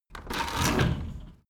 window roller shutter open soft single pull

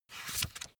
magazine flip back

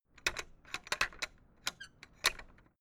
fence gate open latch rattle

